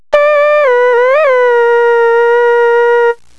Voice, pitch modulation only (controlling pitch bend of synthesiser).